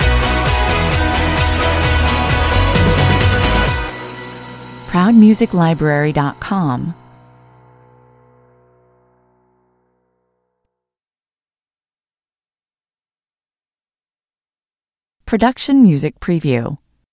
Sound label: neon guitars stinger